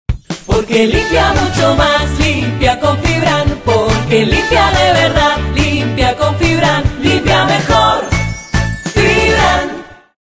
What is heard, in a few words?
audio jingle radio; Fibran